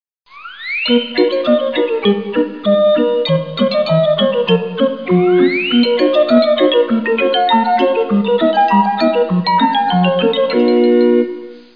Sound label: circus bear; T.J. Finds a Friend